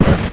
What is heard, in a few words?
golem attack